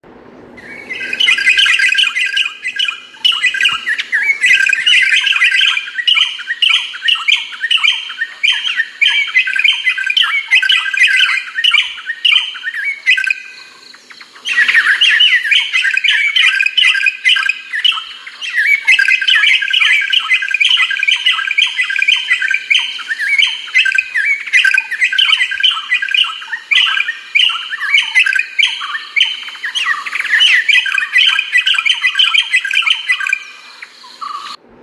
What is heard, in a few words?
Racket tailed drongo